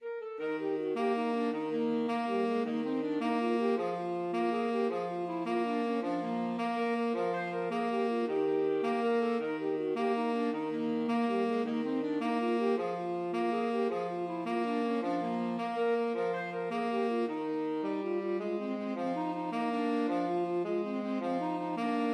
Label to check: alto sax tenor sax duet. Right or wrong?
Right